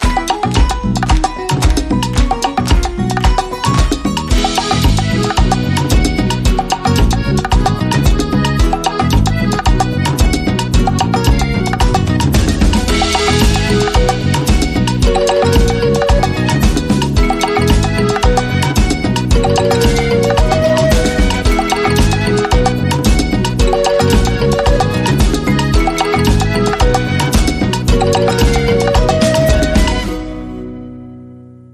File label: Cuban Rhumba